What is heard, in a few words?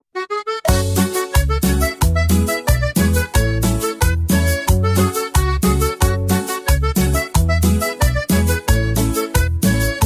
cumbia